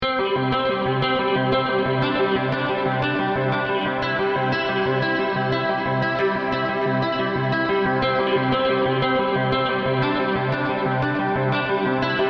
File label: MULTIPHONICS GUITAR